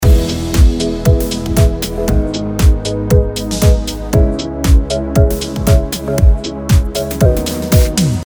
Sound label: Dream Sky Loop; Motion-Array-Dream-Sky-Loop